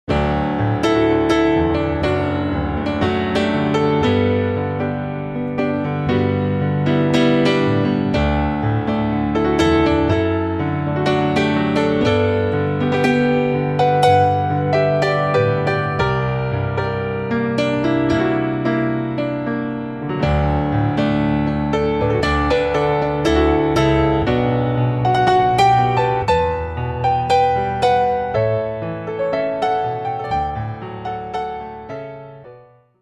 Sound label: Pno stereo pair